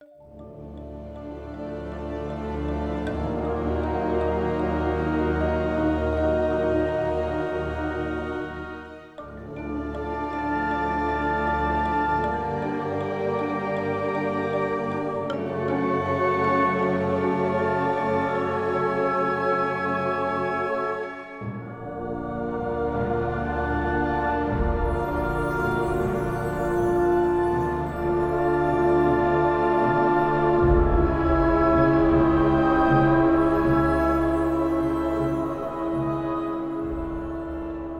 castle music

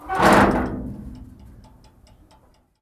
Dumpster Open

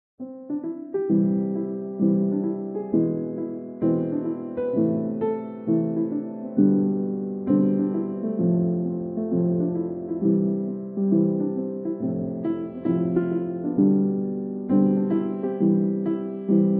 Soft Piano; Cutsence; Intimate

